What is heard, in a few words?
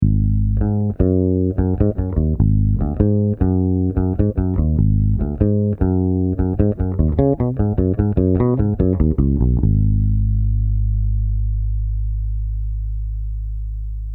Bridge Pickup Solo